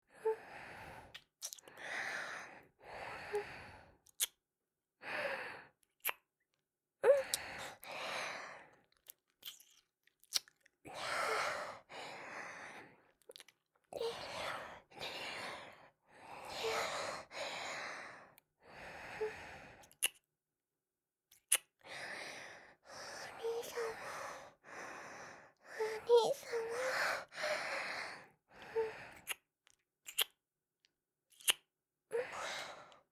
キス; リリア

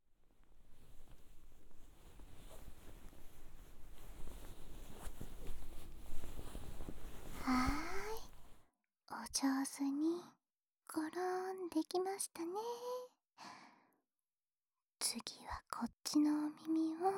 太ももに甘えながら耳かき（左耳）

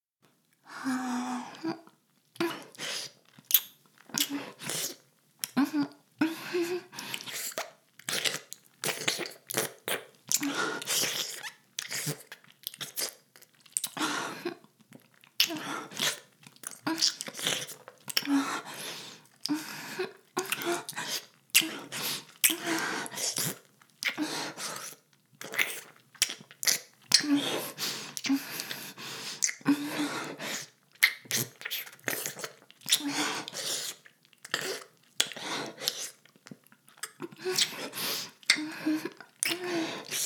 耳舐め, ASMR; 淫妖精の森; ビンビンオッタテハのソロパート

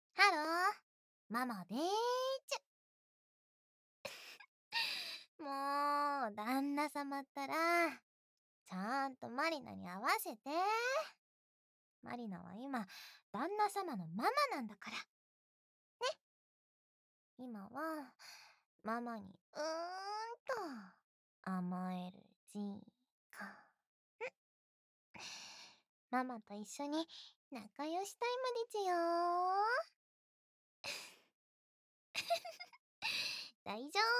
耳舐め手コキ（左右反転）; まりなママでちゅまちゅ

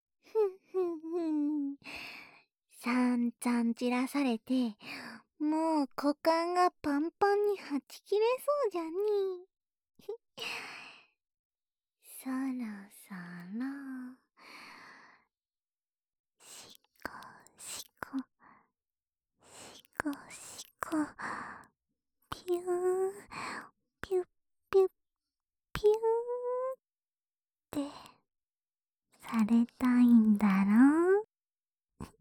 ベロしゃぶり手コキ, ASMR

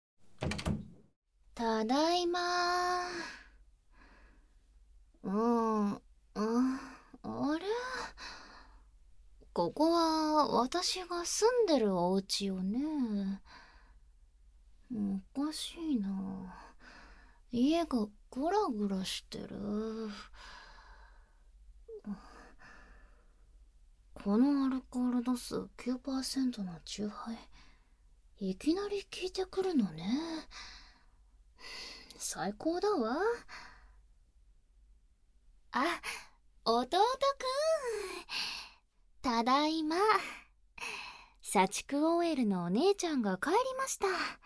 お姉ちゃんの耳舐め添い寝; 愛情過多でぽんこつなお姉ちゃんの耳舐め添い寝

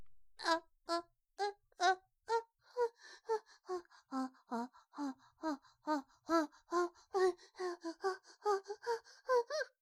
あ、あ、あ、あ、, ノーマルマイク

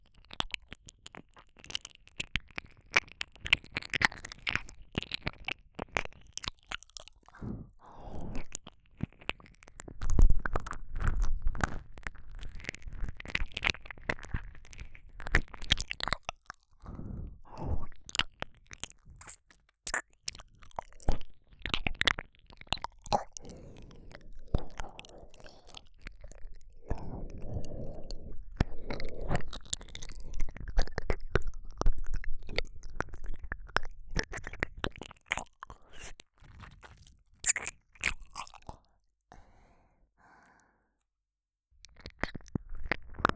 ループ耳舐め 右; 甘々でクーデレなお姉ちゃんといっしょ

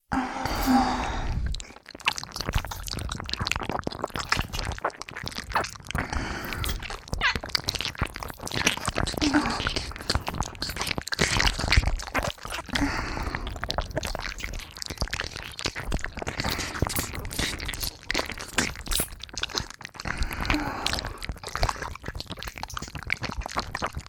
耳舐めキス騎乗位, プレイ音のみ

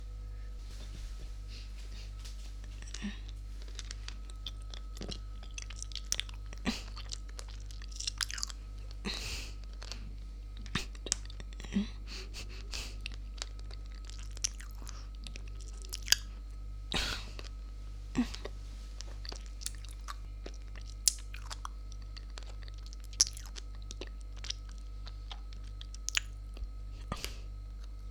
耳元で 飴舐め ステレオ

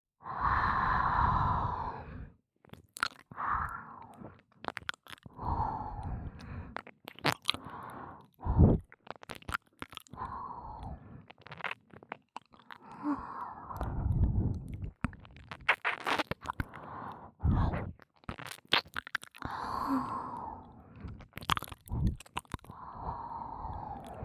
ハムハム耳舐め左耳; 耳舐めコレクション

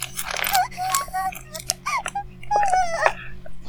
バイブ放置

